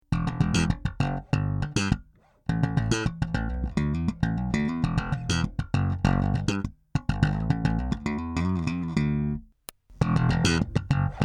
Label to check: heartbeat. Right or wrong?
Wrong